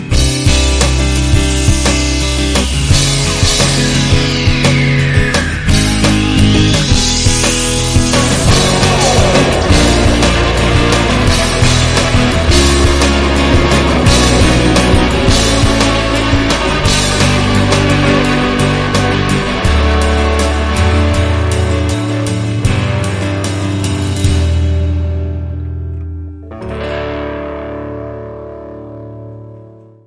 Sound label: Chorus